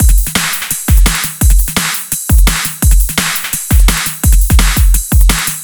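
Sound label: Arena Kit C, drum bass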